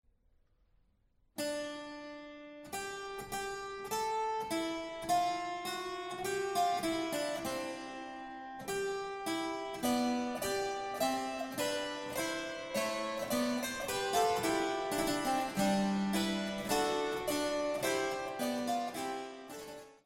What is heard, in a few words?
Fantasia a4 mixolydisch; Anthoni van Noordt; Zesde